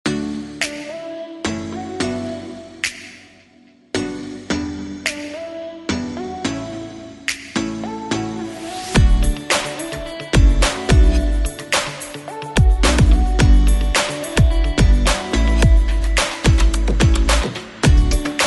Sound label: rock pop; Background Music